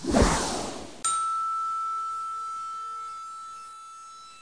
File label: wind ding